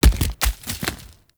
Tree Dead Large Destroy Chunk; Objects; Destructible Trees

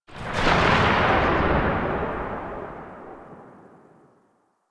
Gunbrella Alt Tail, Weapons; Warframe; Tenno; Koumei

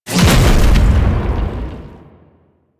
Archon Whip Ranged Attack Proj Explosion; Enemies; Weapons; Warframe; The New War; Nira

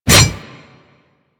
Ghoul Devourer Melee Swipe

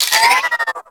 Space Port Cargo Scanned Beep; Venus; Objective Rooms

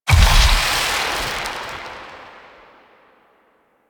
Shadow Particle Death; Warframe; Duviri

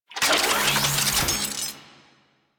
Reload, Weapons; Warframe; Archwing; Larkspur Prime

